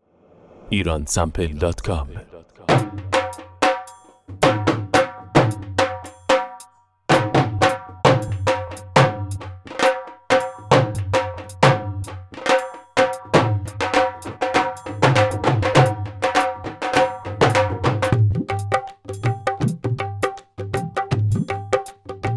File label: indian percussions